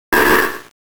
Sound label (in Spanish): Grito de Slakoth